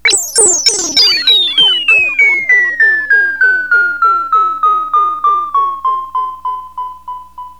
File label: chroma long, Epiano